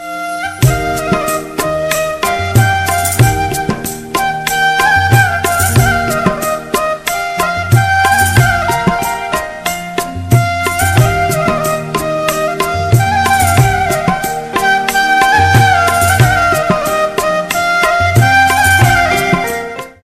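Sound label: old romantic instrumental ringtone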